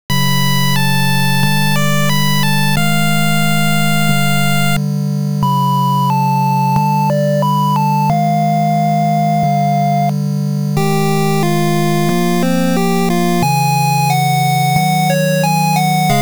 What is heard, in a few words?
Ocarina, Nocturne, 8bit